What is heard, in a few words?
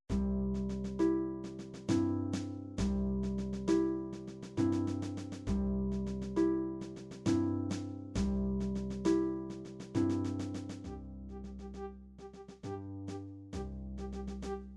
latin bolero, midi